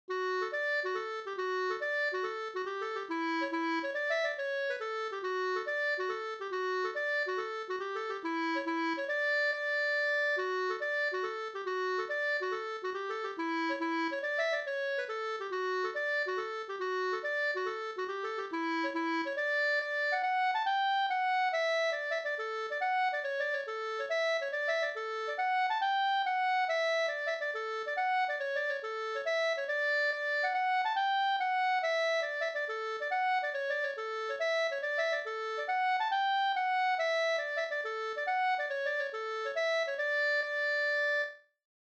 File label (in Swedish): hambopolska; Hambo fr Anglamark